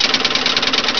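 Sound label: MECH CLATTER, MACHINES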